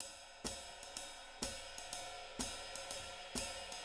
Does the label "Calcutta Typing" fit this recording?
No